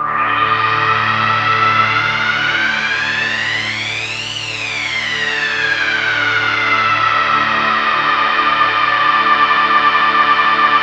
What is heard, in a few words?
RESO WASH, Synth FX Misc